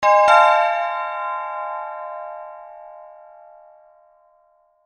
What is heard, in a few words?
chime bells; Ki11er Clutter; Switch gamerip